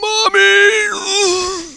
Die

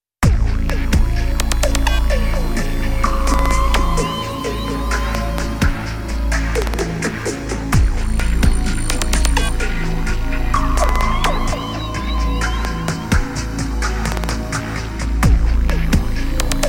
Disquieting Atmosphere; ryu ga gotoku of the end; yakuza dead souls

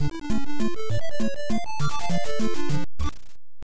VS Draw; bomberman ii; nes

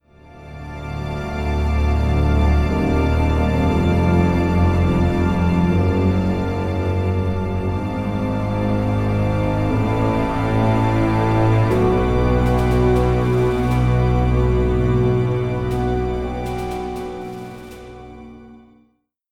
Ship Lost Stinger

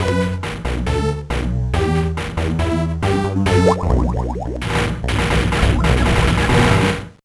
Bathroom Jam

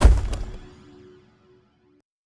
Level Editor Object Place Stone

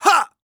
warrior attack swing

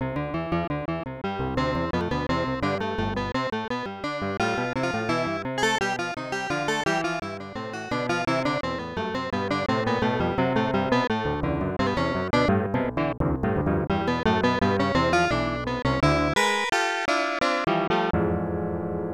Piano; doukyusei pc 9801

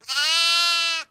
bah

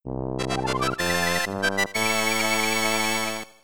Round Victory; Wario's Woods

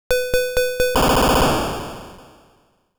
Time Bomb Sound

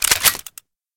Sound Mod Weapon Perfect; Synthetik Legion Rising

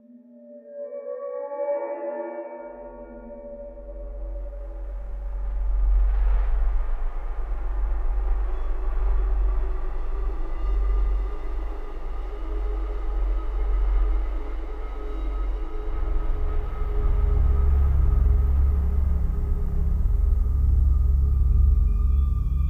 Intro Ambient; Spider-Man 2 The Game

